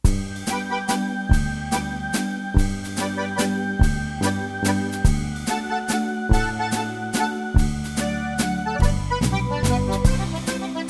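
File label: Musette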